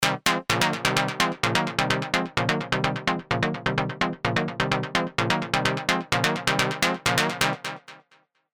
Synth Loop C 128 BPM; Sylenth1; Retroit

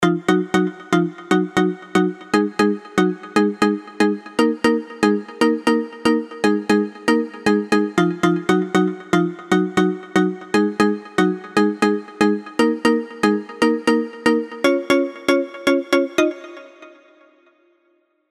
Mallet; Serum

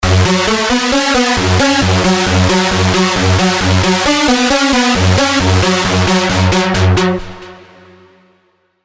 lead, spire trance leads; Bright Sign

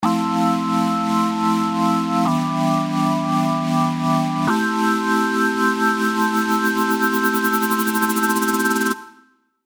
Simple Sines; mango

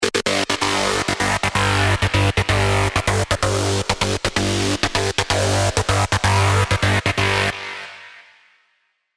Synth Loop G 128 BPM; Spire; Transition Six

